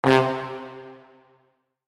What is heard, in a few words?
Trombone Brass